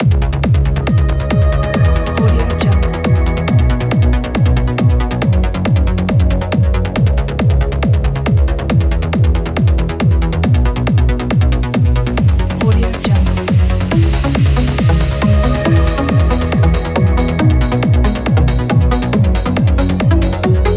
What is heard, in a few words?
trance